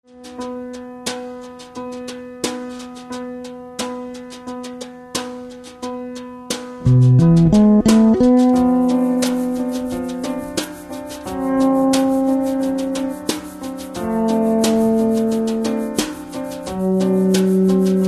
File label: bass